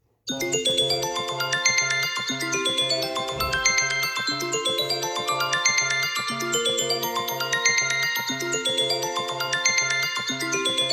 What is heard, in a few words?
iphone ring; volny